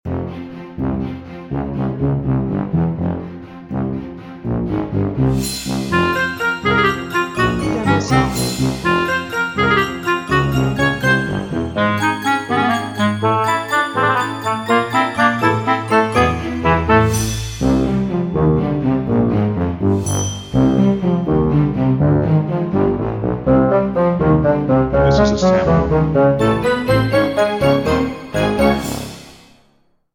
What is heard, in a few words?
Funny Clarinet Madness